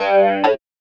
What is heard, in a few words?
synth house 125 A; eJay; Keys